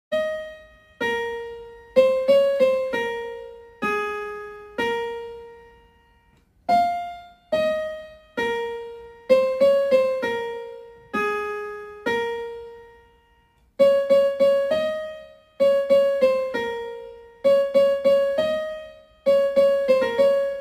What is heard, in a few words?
piano notes